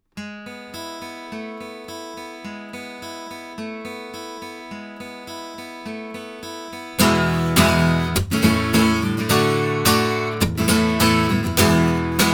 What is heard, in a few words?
Golden Age FC3 cardioid guitar Gibson